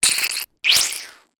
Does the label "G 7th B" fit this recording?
No